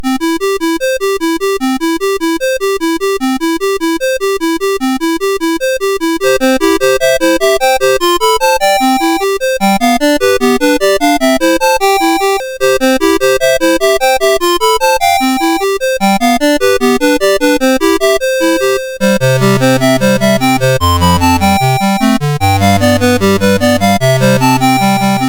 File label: Music Box, MOS6581R4